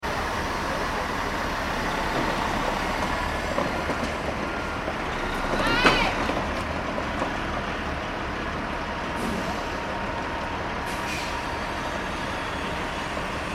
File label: Construction Site With Truck Ambience Ground Zero Nyc; entrance; Backgrounds; McKinney Sound